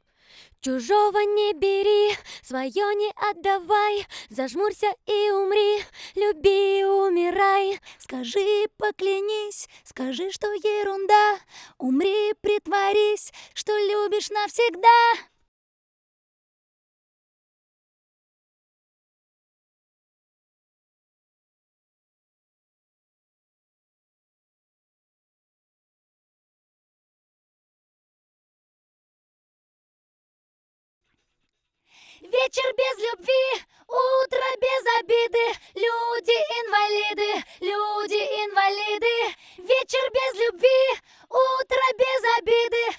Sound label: Dry BPM Acapella